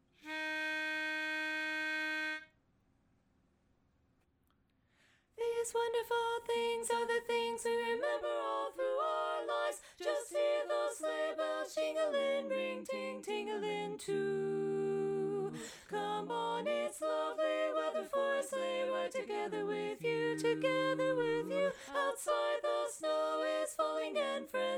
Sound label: tenor; holiday music